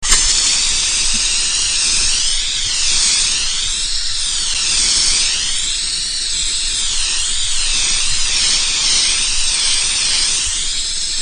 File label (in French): Bombe aerosol; bruitage; Electro-menager